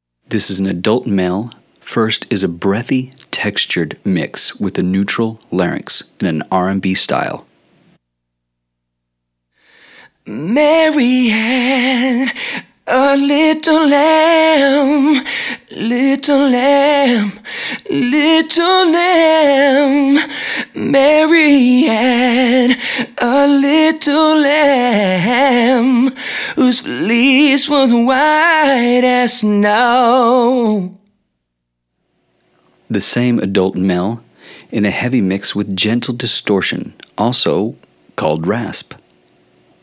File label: Exercise Mix Examples male